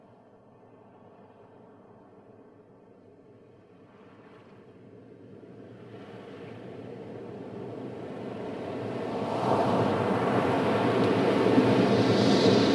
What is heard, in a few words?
Sounds from the Sea Whale Song; Whale Songs, Chants; Sounds from the Seas and the Oceans; Nature Chill Out 2014